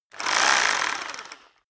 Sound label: rock drill hammer rev medium; maschinen; bohrer; soundpool